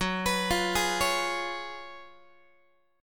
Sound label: Gb Major 7th Suspended 2nd Suspended 4th, Guitar; GbM7sus2sus4 arpeggiated; JGuitar